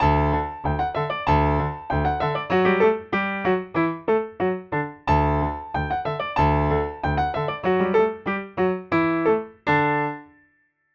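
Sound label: open lid live medium stereo loud Steingraeber, piano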